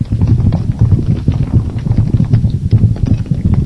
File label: Rocks; 暴力赛车